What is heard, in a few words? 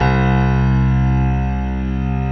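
grand piano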